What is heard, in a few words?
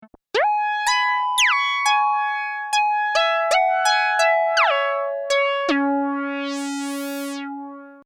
Bells